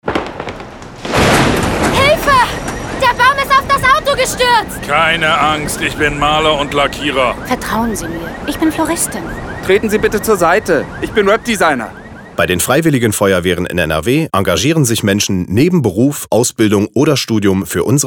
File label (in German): Freiwillige Feuerwehr Sturm